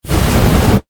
Flame Booster Short, Burst; Science, Fiction; Spacecraft; BlastWave

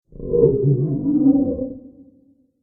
Creepy Creature Growl Transition; Scary Soundscapes; Horror; CraftedSignals

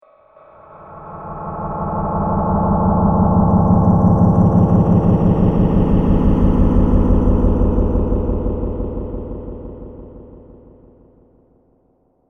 Scary World Powerful Ambience, scary soundscape; horror

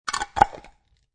Screw Lid Onto A Jar Of Jam; Lids; Objects

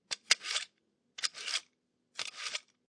Knife Sharpener Sharpen Kitchen Knife; Scrapes; Sound Effects:Objects; Lynne Music

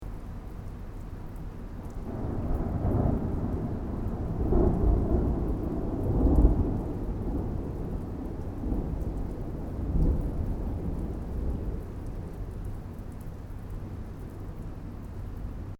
THUNDER DISTANT STEREO; Elemental weather effects

